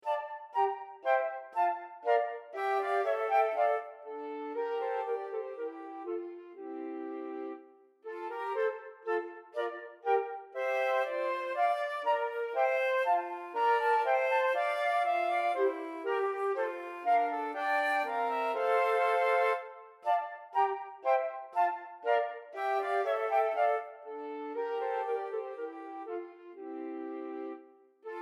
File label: BOURREE